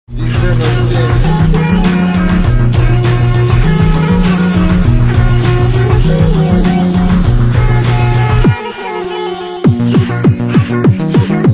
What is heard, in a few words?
speed up night core remix